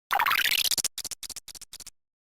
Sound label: life up